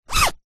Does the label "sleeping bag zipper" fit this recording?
Yes